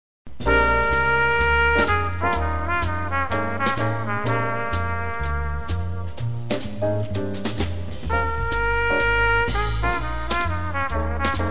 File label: BLUES, jazz, trompette